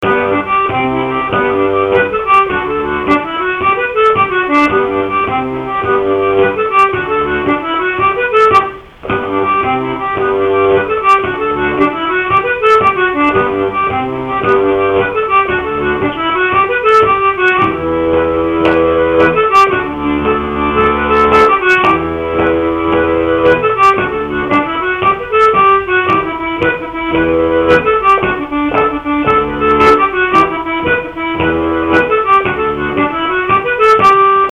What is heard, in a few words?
Tripping up the stairs version cercle circassien; rassemblement-ateliers